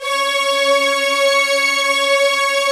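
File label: Chinese orchestra, Filter; Optical Media International; Sonic Images Library; Chinese Wall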